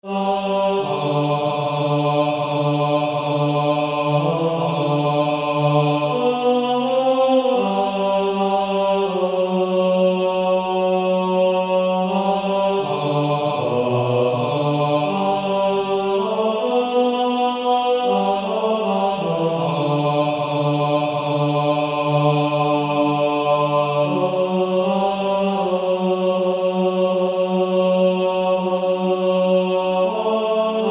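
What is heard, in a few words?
Male Choir, bass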